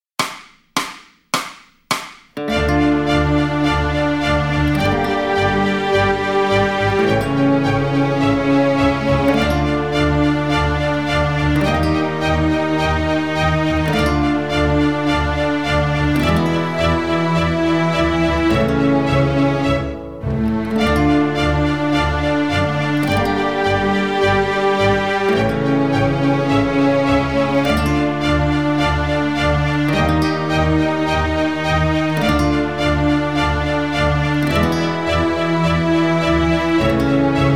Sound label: Barrock Backing